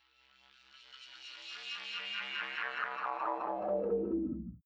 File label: energy generator start settle